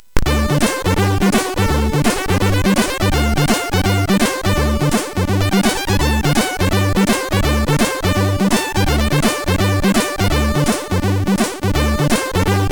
JAZZZZZ, MOS6581R4; hvsc

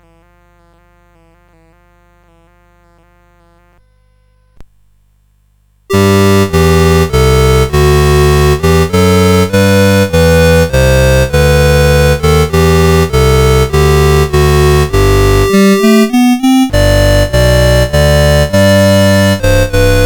God Save the Queen, CSG 8580R5; Lord UK

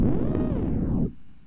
Tiger Growling, MOS6581R2; SoundEffects

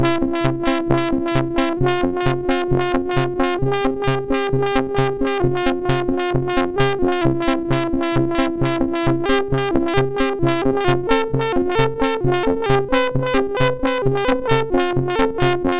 sid MOS6581R4, GAMES; Frenzy

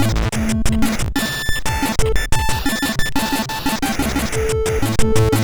Ambieent, MOS6581R3, sid

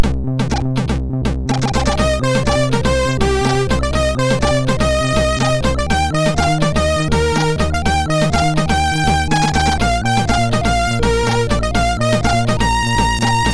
Crack Intro, sid MOS6581R2; hvsc

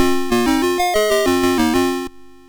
Quari, MOS6581R2

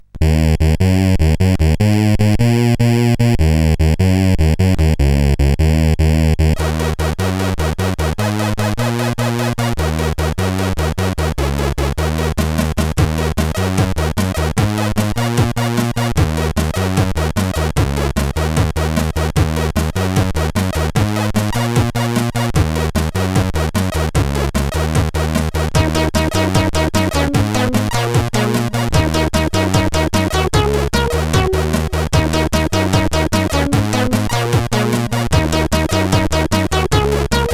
Crystal Sheep, sid CSG8580R5

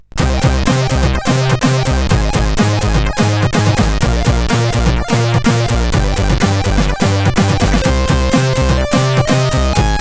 Intro Tune, CSG8580R5

